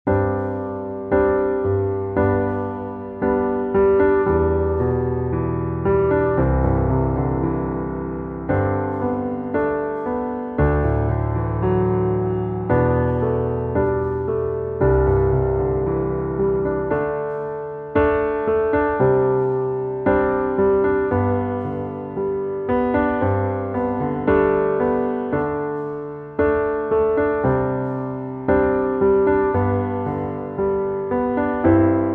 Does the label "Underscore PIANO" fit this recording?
Yes